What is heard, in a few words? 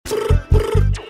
Brrt